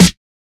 verby steel snare drum a sharp key

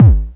boom kickdrum sound G sharp key

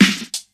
steel snare drum g key; snare drum samples

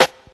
smooth foot hi hat sound c key; hat samples

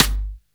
00s wet acoustic snare g key; Duration 0:01; Samplerate 44100 Hz; snare drum samples

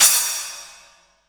crash cymbal sound sample f key; tuned cymbal samples

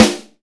smooth steel snare drum sound G sharp key

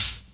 loud closed hi hat sound A key

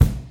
acoustic steel kick drum, B key

